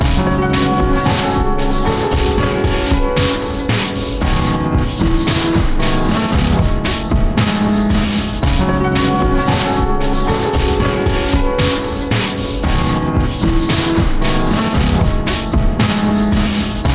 Piano

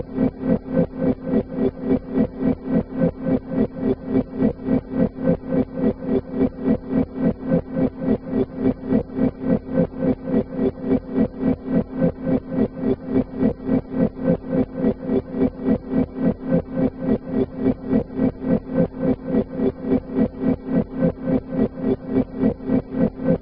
looping slow

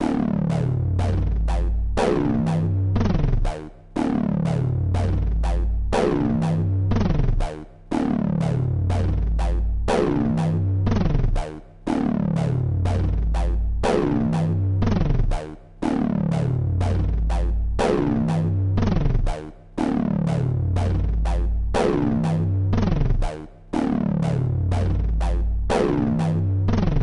bgm acid slow

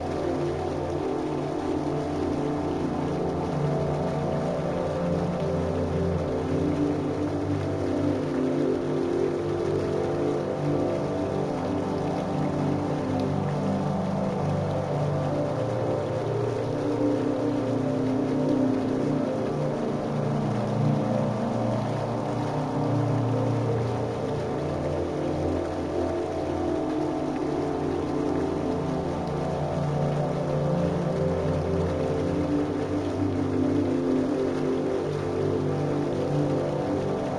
technicolor drone

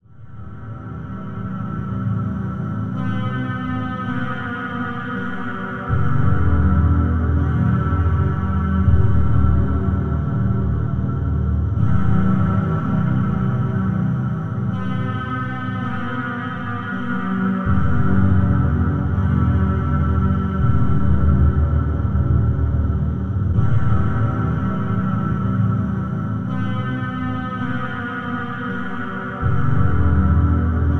mallsoft

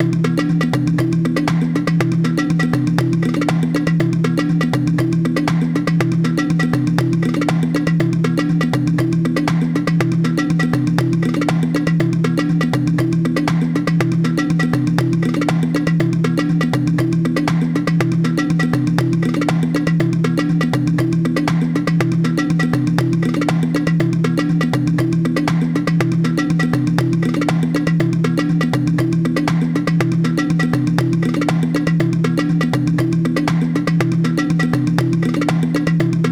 malimba

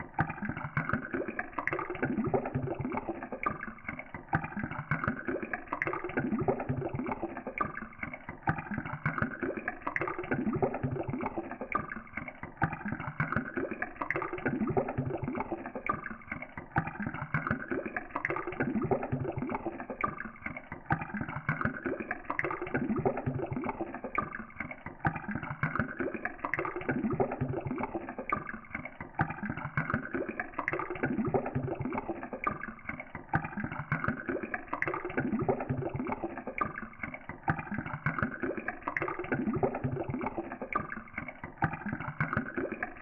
BGM Bubbles; length 1 min 6 s; fast150

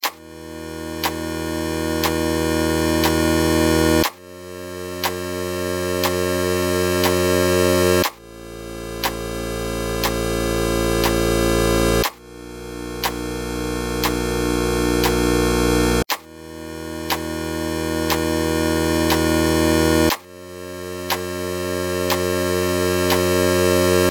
clock

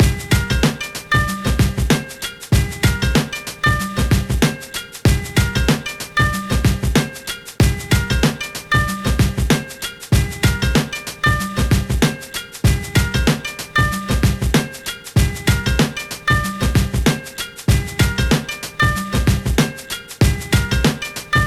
BGM valiha beat

